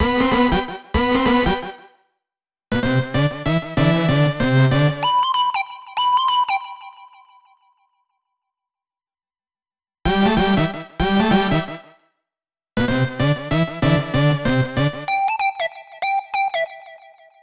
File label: Synth melodies; Vostok Inc.